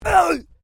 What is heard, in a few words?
MALE VOCALIZATION ARGH; Male vocal sounds; Exclamations; Voice